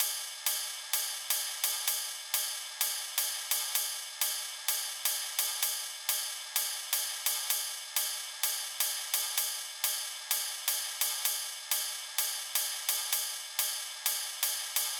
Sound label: ride, Key D#, Bpm 128; Loop Nerds; Big Room House Allstarz